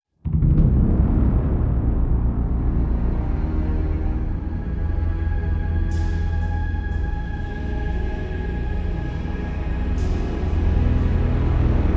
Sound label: Opening, Classic Horror Sci-Fi Music Pack; Opening 5 (26sec); Dead Ark